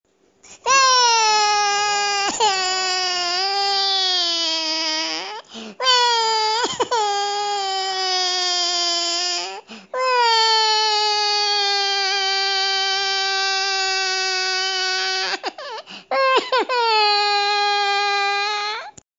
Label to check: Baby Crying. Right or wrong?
Right